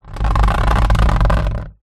plastic washing basket tub drag on concrete ground; foley